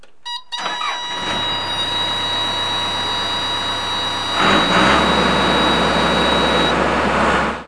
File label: Bobcat, Construction